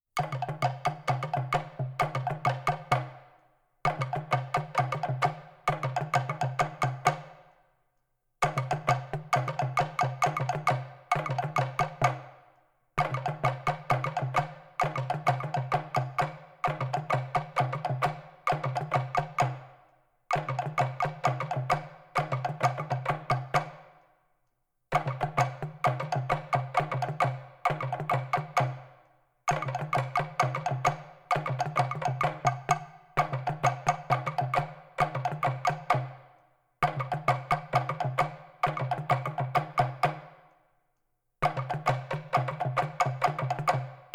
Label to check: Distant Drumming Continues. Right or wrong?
Right